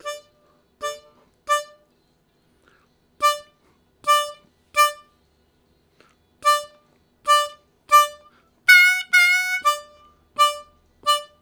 Harmonica, WAV Riffs; Zero G

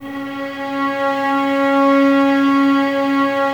STR VAS C#, Violas, No Vib; Miroslav Vitous

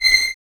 Violins C 7; Miroslav Vitous; String Ensembles

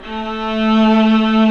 viola, 3D Viola Pad; E-MU Producer Series Vol. 5 – 3-D Audio Collection; 3DSynths

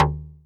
Tempest Analog Snare, Drum Machines; 300 Drum Machines; Dave Smith Instruments

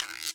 percussion, Millenium MPS-400

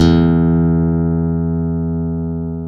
stick, Chapman Stick; Analog Odyssey

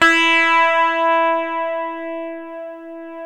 GTR CH STRT, Clean E.Gtr, Ultra Sheen; Roland L-CD701; Clean E.Gtr4

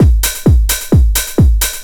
drum 130 straight; Techno Trance Essentials

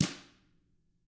TAMA BR MF, Drums; ILIO; Double Platinum Drums 1

